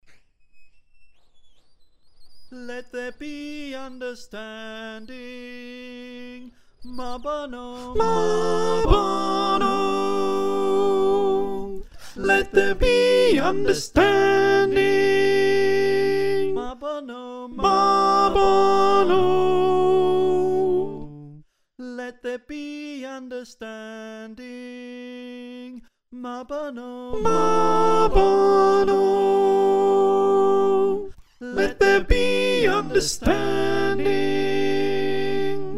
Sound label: Soprano, choir